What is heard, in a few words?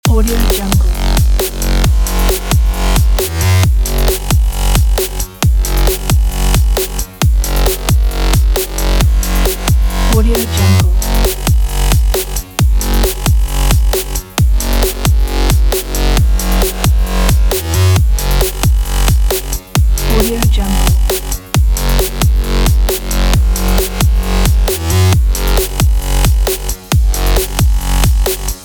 breaks loop; playtek